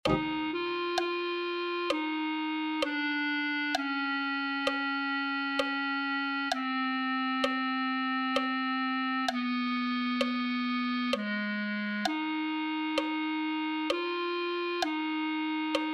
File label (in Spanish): clarinete